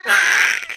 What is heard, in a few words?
Pterrordax Hatchling Play